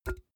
empty can pick up hard

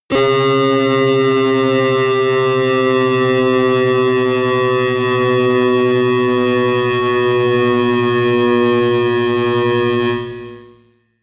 bass drop slow long

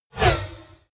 sci-fi whoosh by

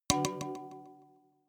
notification kalimba delay body hit

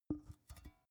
big can empty put down

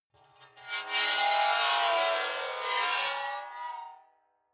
metal scrape rich

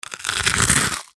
eating chew crisp

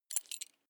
metal belt buckle clatter soft

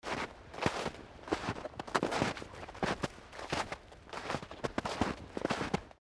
steps in snow

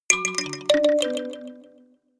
notification kalimba delay sequence minor

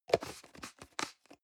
plastic can small lid screw close hard

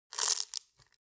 velcro undo roomy short

